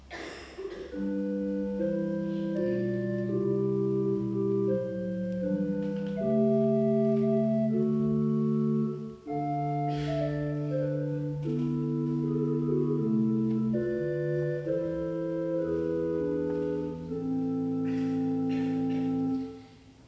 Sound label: fluit, orgel; assen